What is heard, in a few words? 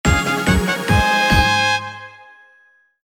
levelup